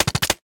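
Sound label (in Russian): незапланированная пулемётная осечка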